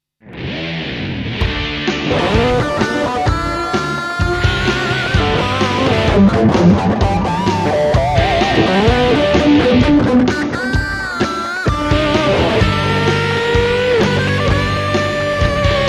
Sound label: solo